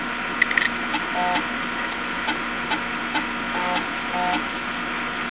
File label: computer flopy disk; kz ins industryrun